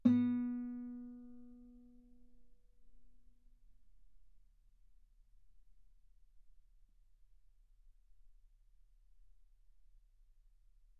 Guitar ff B3; sul B

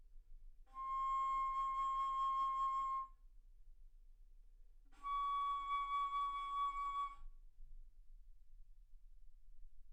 Bass Flute ff C6 Db6

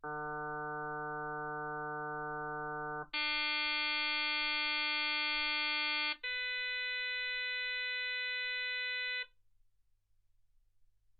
harm sinus 48kHz

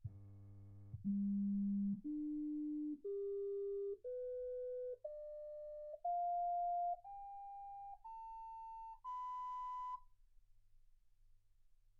sinus tones 48kHz

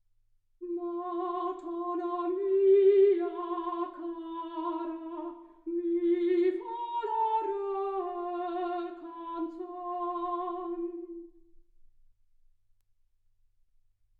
soprano

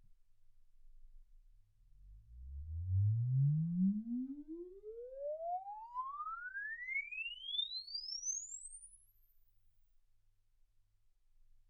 exp swept sinus 10Hz 24kHz

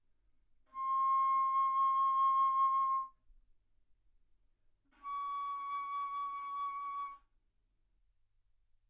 Bass Flute ff C6 Db6

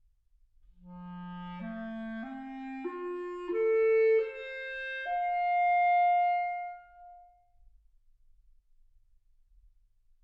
clarinet

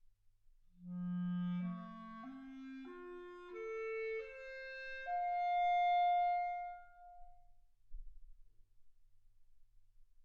clarinet

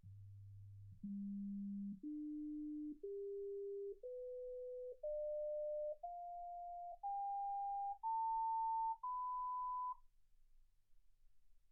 sinus tones 48kHz

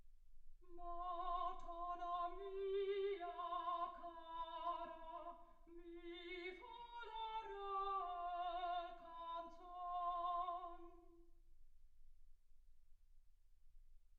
soprano

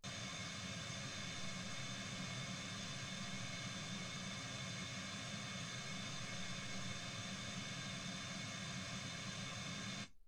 wgn 48kHz

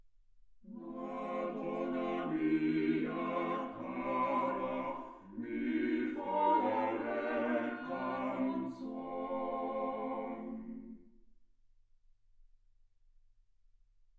quartet

